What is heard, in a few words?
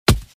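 soccer kick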